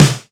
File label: tight acoustic snare sound c sharp key; Duration 0:01; Mono; snare drum samples